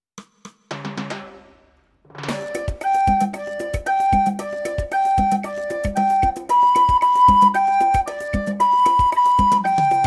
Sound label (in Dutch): Trom en trompet